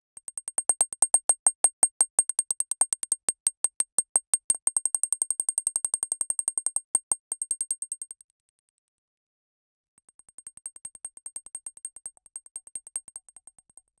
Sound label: crystal ball bouncing on, glass